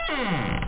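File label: door sound; Keep of the Demon Lord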